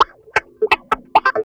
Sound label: Clavinet; Ambient Grooves